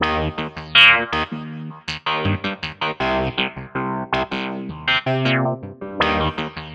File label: filtered loop cycle